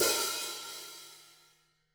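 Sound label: hats open, Drum Kits; Sonic Reality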